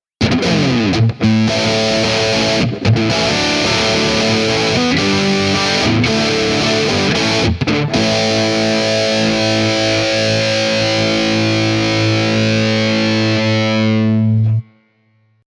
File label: Hairmetal